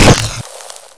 hornet hit; XDM

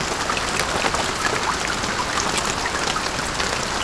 rain, ambience; XDM

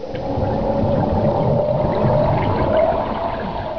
launcher charge; weapons; XDM

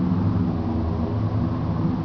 cave, ambience; XDM

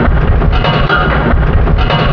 freight move; XDM

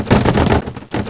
bust ceiling, debris; XDM

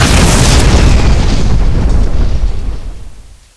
beam blast, weapons; XDM

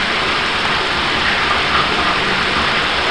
waterfall; XDM; ambience

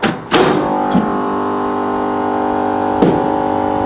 7500kVA transformer start; XDM; ambience

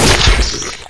launch, weapons; XDM

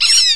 rat die; XDM

